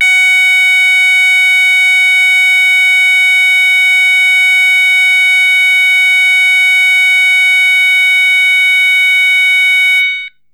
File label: Sounder